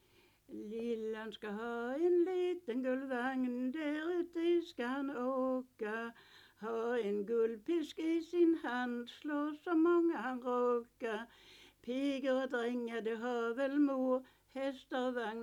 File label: Folk music of Småland; Lillen ska ha en liten gullvagn